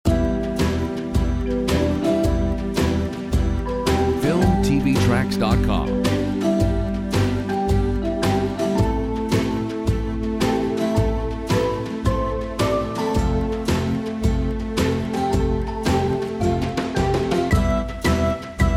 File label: Quirky; Filmtv-tracks